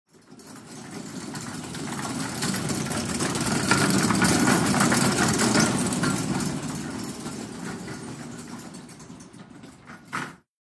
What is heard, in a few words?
Gurney Cart Pass Fast, gurneys